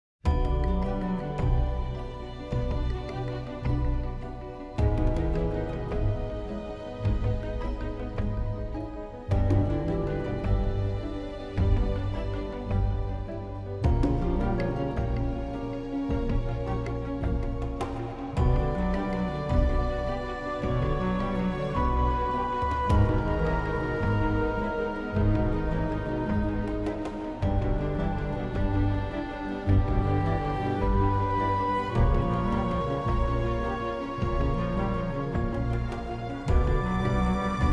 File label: Rotating Room; wellspring